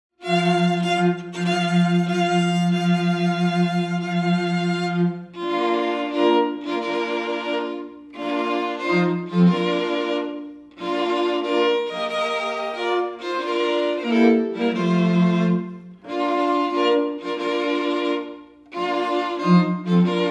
Bridal Chorus, String Quartet; Lohengrin

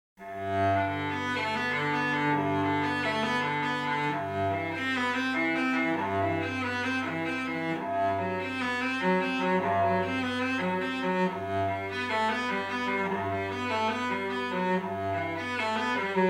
solo cello; Prelude; Bach; Acoustic Duo

